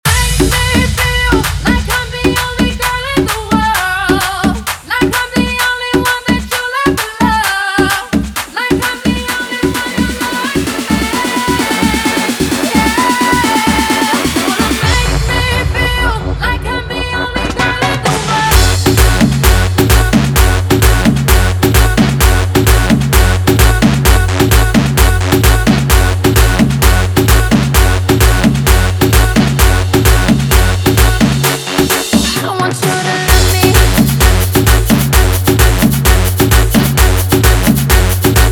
guaracha remix 130 bpm

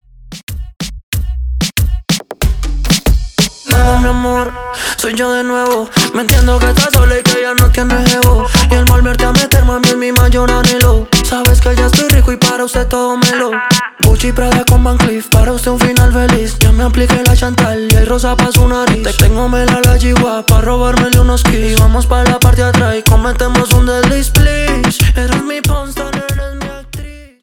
Intro Verso 93 Bpm

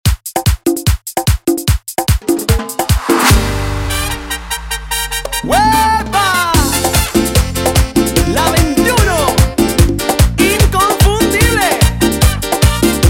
banda 148bpm

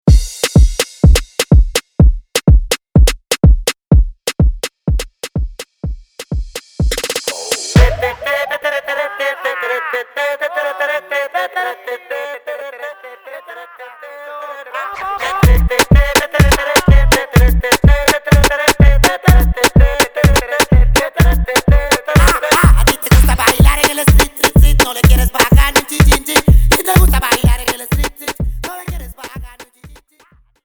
Intro Verso 120 BPM

